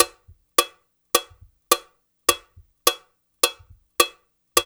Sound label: cowbell; East West